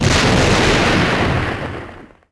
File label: explo; Star Wars Obi Wan; XBOX